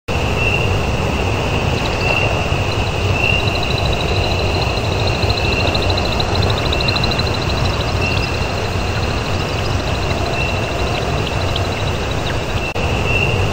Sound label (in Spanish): humedales efecto de sonido